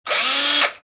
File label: Electric drill quick drilling short